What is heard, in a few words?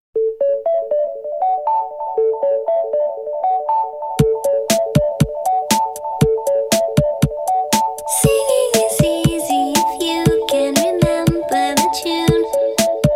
Glockenpop